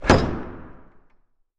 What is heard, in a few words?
Bolts Var Reverb, latches